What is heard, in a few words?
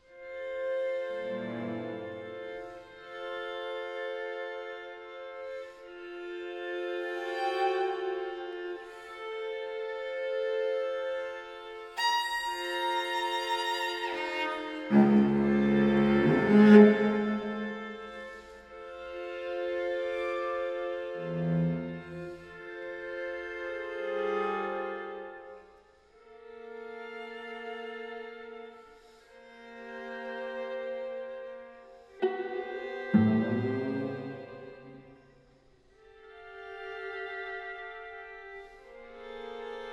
microludes for string quartet